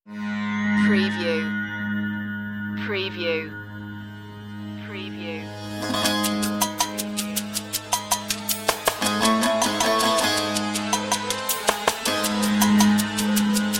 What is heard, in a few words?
eastern pulses